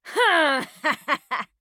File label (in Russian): смех; Сомбра